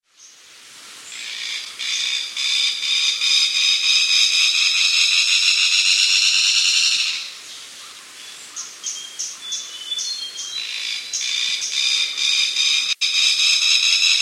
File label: barbet